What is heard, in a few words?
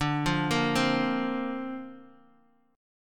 D-Minor 7th Sharp 5th D Bass Standard, chord; Dm7#5; arpeggiated